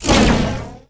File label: bullet laser; 星际争霸音效; 星际争霸其他